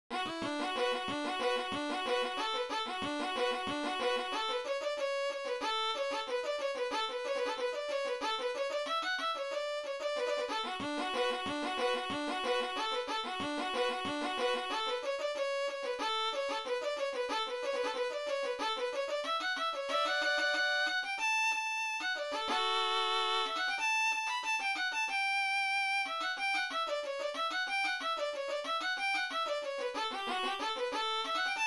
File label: Ragtime Melody; Ragtime Annie